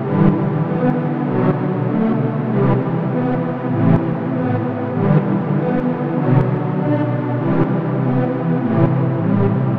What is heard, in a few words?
planetary pad, E min; instruments; loops; synth